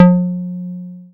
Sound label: Bass SH-101